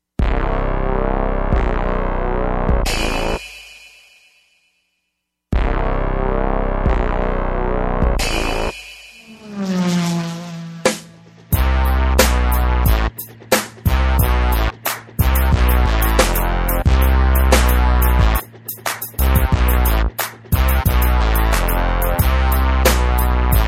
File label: gangsta beat